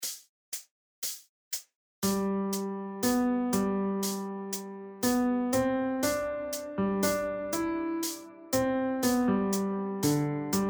EXERCICE BINAIRE

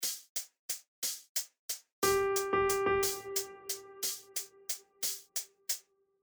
Sicilienne Ternaire

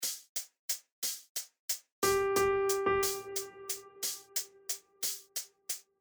Sicilienne Ternaire

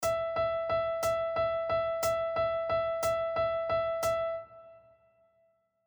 Binaire triolet de croches

